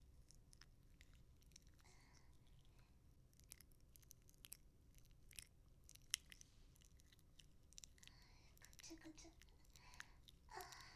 耳元くちゅ音 ループ用 バイノーラル, ASMR

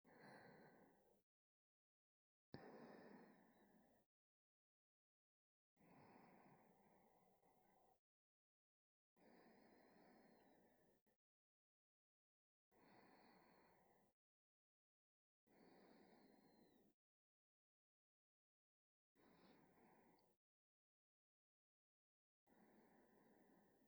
寝息ループ

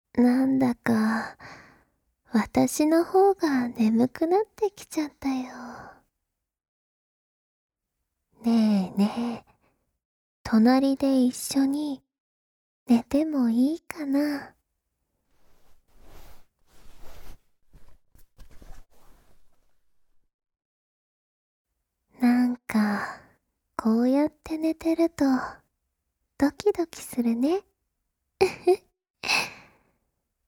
添い寝

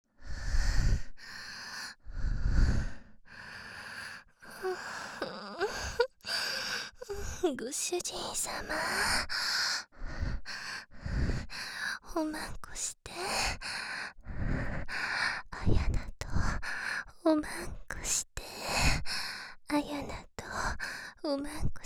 エッチしよ 喘ぎ; DL製作班; ハーレムオナサポ 4人のメイドがご主人様のオナニーをお手伝いするバイノーラル

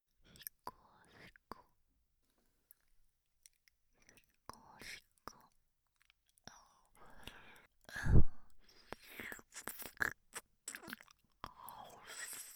しこしこ、ずぼずぼ, 効果音なし, 右耳

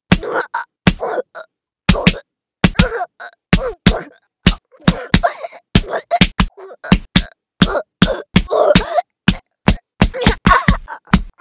殴り続ける; 効果音あり

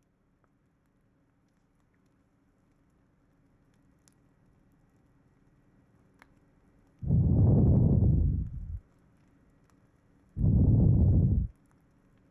耳かき・右耳（ヘチマ水マッサージ、耳かき), ASMR; ちせのだいじだいじ耳かき

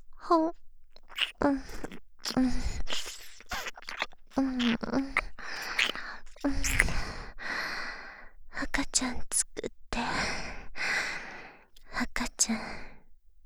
耳舐め; 赤ちゃん作ろ♪; ハーレムオナサポ 4人のメイドがご主人様のオナニーをお手伝いするバイノーラル～文、千曜、名月、莉桜～

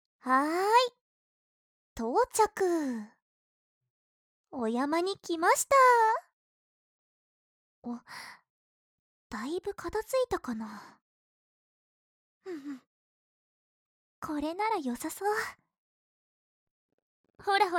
ブリキバケツおしっこ, 紙コップおしっこ; お山で露出プレイ

